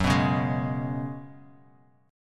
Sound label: F sharp Suspended 2nd Suspended 4th; strummed; JGuitar